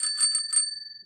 bicycle bell; anemoi

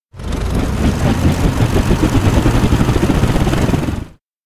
elec plane propeller start; anemoi

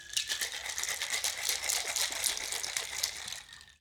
Shake; anemoi

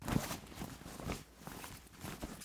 rustle bag; anemoi

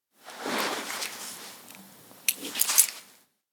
dog collar put; anemoi

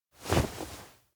face close; awayuki; anemoi

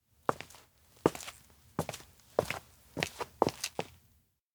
steps outdoor town circle behind; awayuki; anemoi

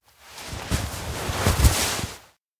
kigurumi on; anemoi

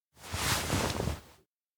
hand over item; anemoi パッケージ版 1.1

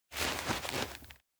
glove rub; chiharu; anemoi パッケージ版 1.1

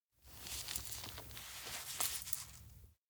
collar repair; anemoi

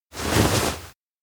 bow head; anemoi

